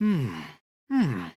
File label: "hmmm"